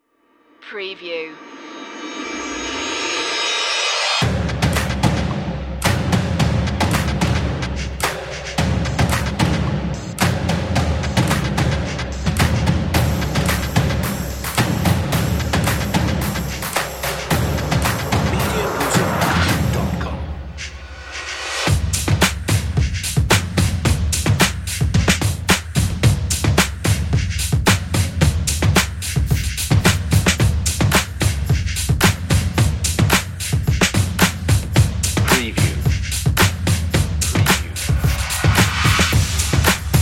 urban beat drums

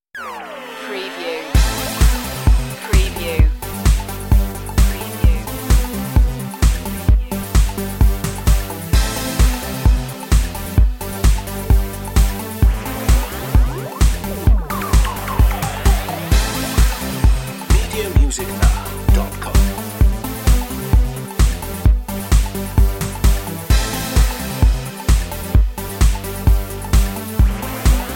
synth; mischievous

